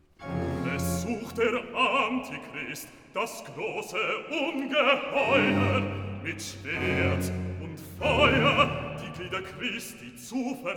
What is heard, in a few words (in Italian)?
Recitativo Bass; Es sucht der Antichrist; BACH VOCAL; CANTATAS; BWV 44; Sie werden euch in den Bann tun